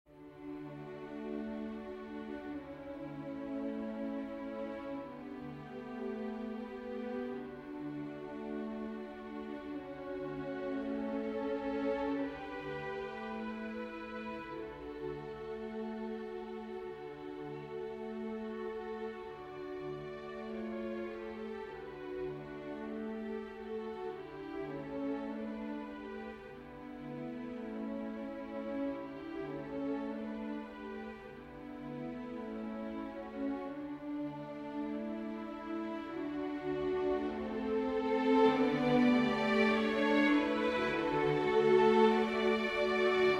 Bordun Lullaby

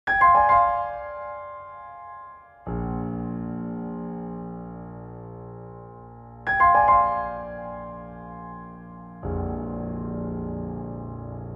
Piano Solo

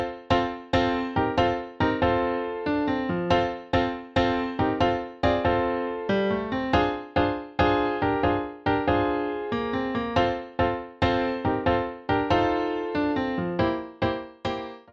Weird Blues; GPP vol.03

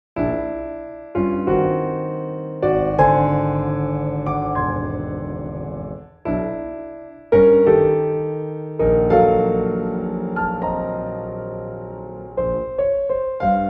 Piano Solo

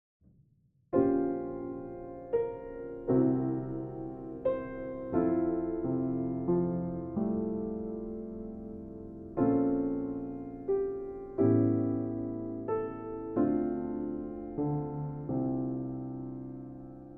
Ballade

